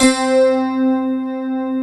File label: FM ELP C3 L, Keyboards Old School; USB Soundscan vol.09; AKAI; FM ELP 5